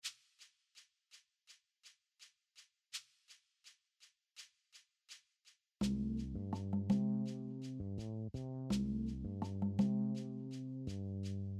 MUSICA; SALA DE CONTROL

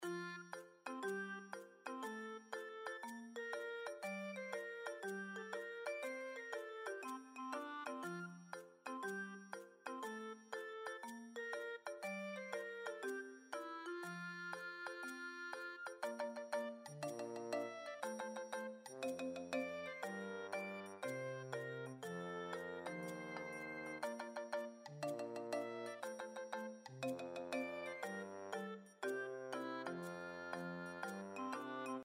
MUSICA; Mekanta

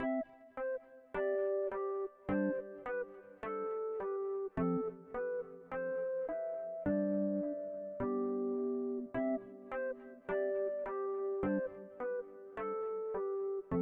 musica; Mekanta

